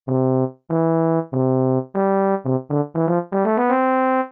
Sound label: tuba